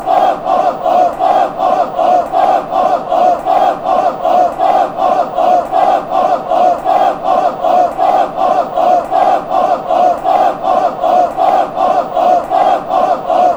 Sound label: off off off, chants; dcfc